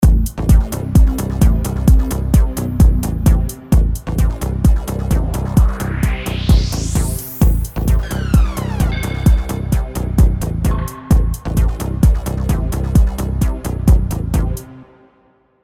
new track; טראקים שבניתי בFL סטודיו

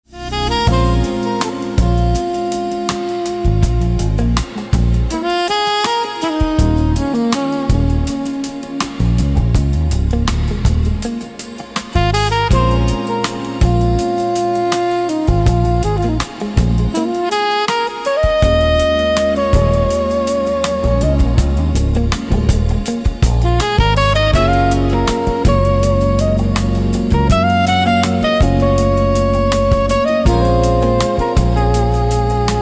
smooth lounge jazz

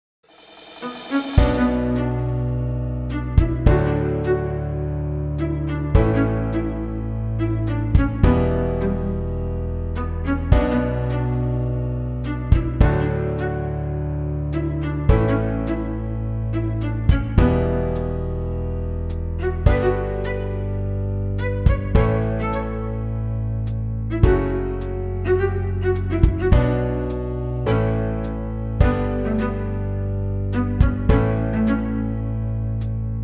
solo string

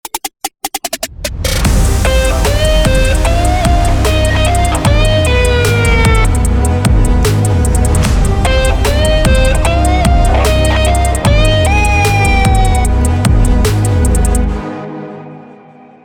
trap

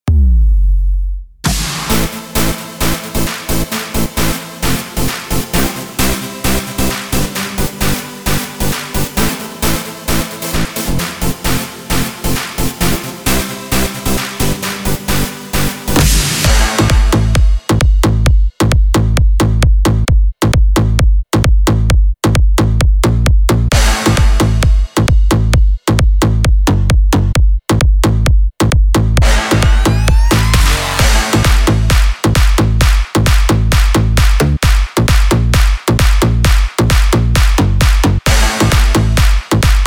remix

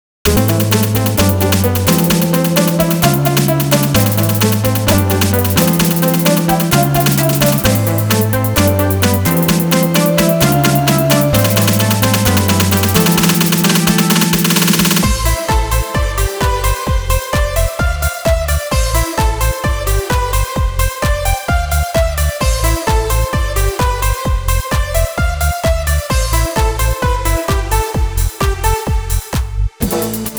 dj

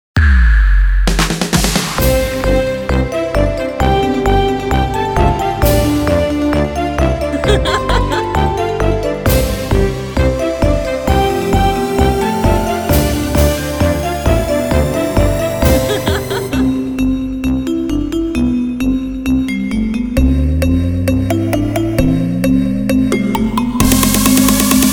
concert dance

